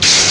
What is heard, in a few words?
bash it; Total Chaos Nukem 3d Pack; duke